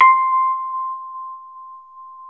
Chor Rhodes, C; E-MU Producer Series Vol. 5 – 3-D Audio Collection; 3DRhodes; 3DChorRhodes1.5

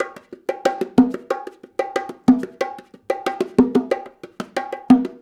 Call And Response Bongos, 70s Analog Funk; Sonic Foundry (Sony Creative Software); What it Is!

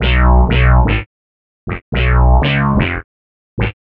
Multifunk bass, Synth Bass Loops; Sonic Foundry (Sony Creative Software); Groove Elements

